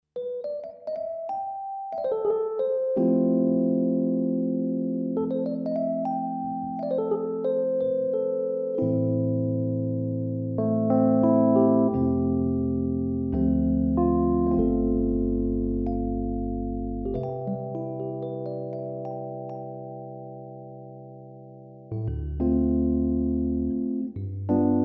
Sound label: E Piano Mark 1; Studiologic Numa X SE